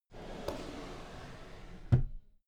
drawer cabinet equipment close soft

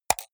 computer mouse right click fast hard

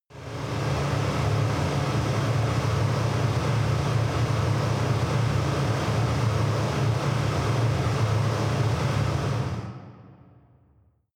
factory hall

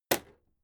impact sweetener wood soft

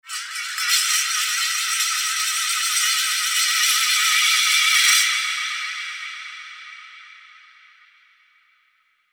twirl

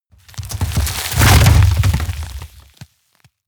ice medium crash

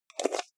jar plastic midsize close fast

